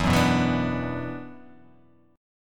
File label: E 7th Suspended 2nd E down, guitar